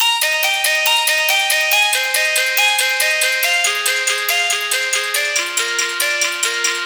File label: Bells, 140 BPM; Vengeance Samples; Vocal-Hook Kits; Song Kit; Play Girl